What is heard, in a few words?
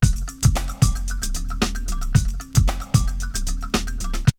Drumloop; ZERO G - Datafile DISC 3